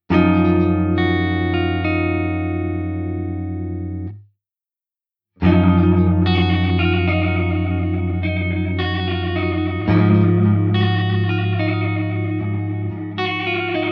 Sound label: quadrature, mobius